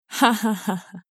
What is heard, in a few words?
jajajaja; basic spanish skills